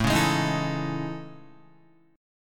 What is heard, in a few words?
A Major Sharp 11th, Guitar; AM#11 strummed